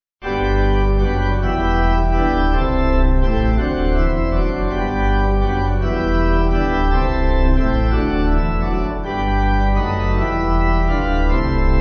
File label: Pipe